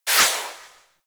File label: Tail; Warframe; Infested; Cernos